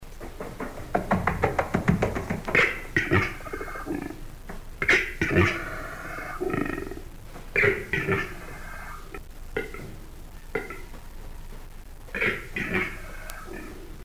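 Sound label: capercaillie threat; Угроза; Краткие сведения об основных охотничьих животных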